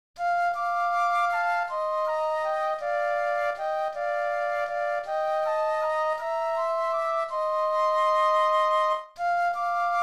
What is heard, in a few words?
Flute Duet; christmas